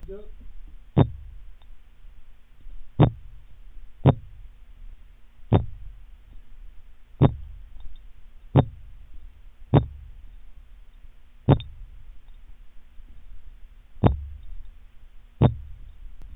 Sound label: Balistapus undulatus Drumroll